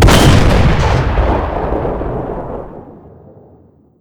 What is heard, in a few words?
sherman cannon; weapons